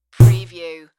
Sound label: Bass Button